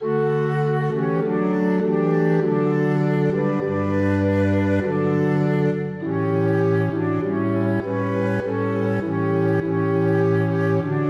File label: polyinstru; Father words of mercy; hymns